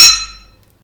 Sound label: Metal Metal, Collision Sounds; clockwerk opensim